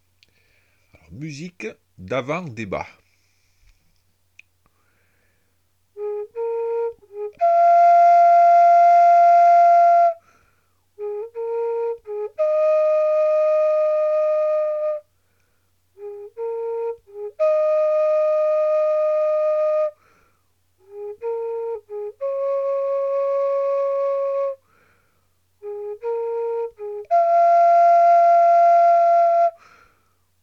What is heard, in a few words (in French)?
musique d avant debat flute